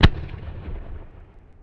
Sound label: distant cannon; WAR